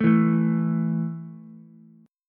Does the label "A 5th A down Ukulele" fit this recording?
Yes